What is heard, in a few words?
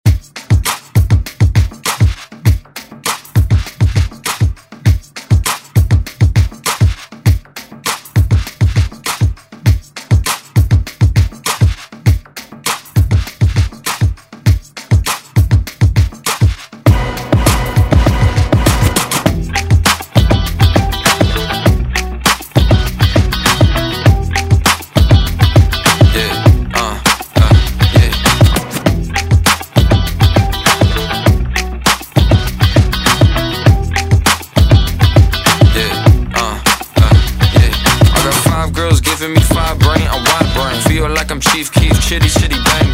Top40 Ext Redrum